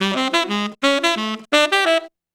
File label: SAX C, ACID JAZZ; Best Service; Advanced Media Trax Modern Composer